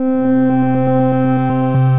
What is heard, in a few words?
Ripple, synth pad; Galactic; Ableton; Glitch and Wash